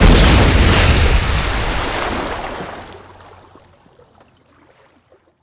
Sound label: Explosions Explode Water; DCS